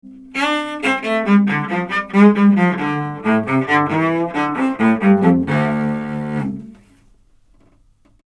avantone ck 7 Cello Omni

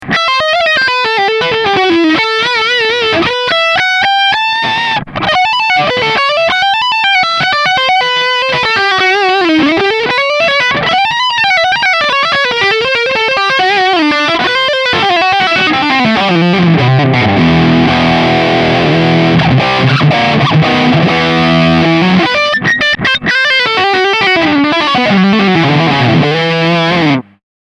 BS Lead

